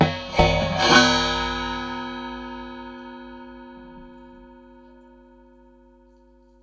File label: Raw HiHat, contact mic; musicradar; contact mic madness